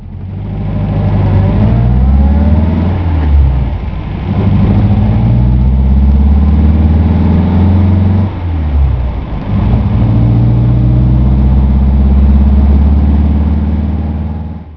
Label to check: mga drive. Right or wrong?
Right